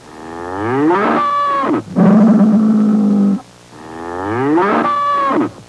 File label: bovini cow bull; animali